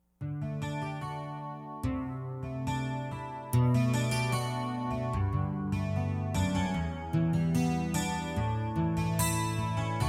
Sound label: Viola